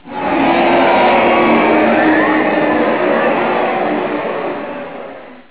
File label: lose